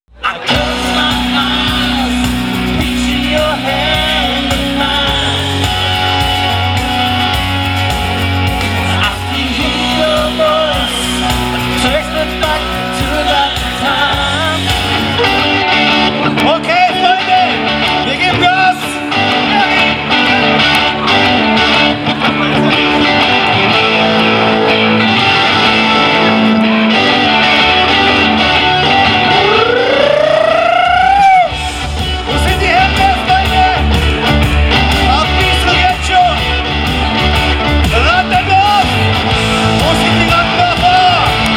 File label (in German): Rock Pop Trailer